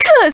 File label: female hit; ambiera; coppercube3d; 3d game demo - darkness springs - mod